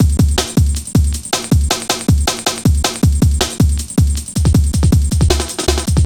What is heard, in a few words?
Drum Loops, 158.5; Jungle